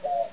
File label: western owl